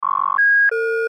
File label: Alarm Beeps